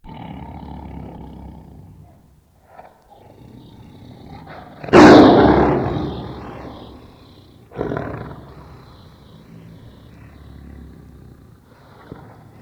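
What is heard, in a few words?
amur tiger, アムールトラ 鳴き声; 多摩動物公園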